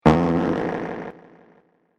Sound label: fart solo